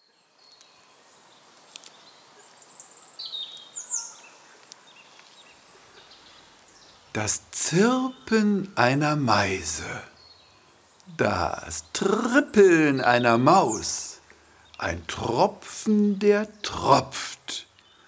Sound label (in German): Pauke Posaune